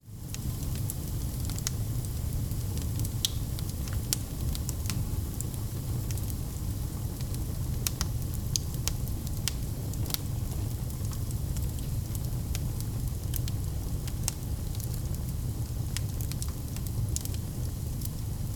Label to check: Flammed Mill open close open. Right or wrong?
Wrong